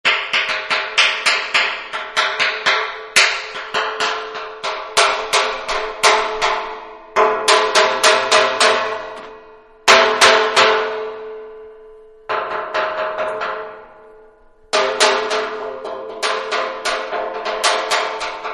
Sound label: Hammer Small On Metal Tank, Several; Tools; Manhattan Production Music